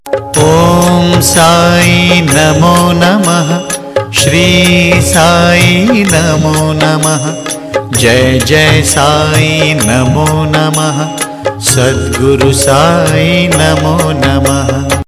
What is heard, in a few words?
om sai namonamaha